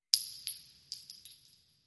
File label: Shell Casings Single; shell casing movement